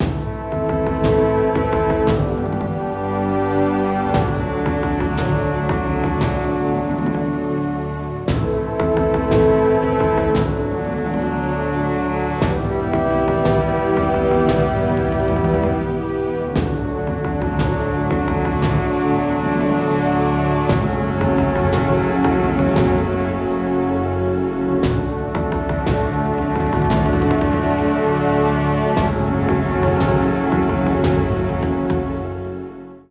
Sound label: Dialog Crafter Loop; skykeepers; ps4 windows xbox one gamerip 2017